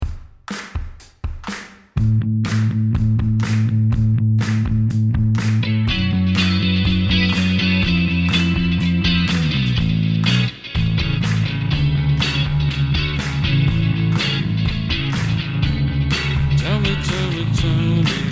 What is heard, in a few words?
rock